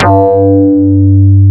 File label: QUITAR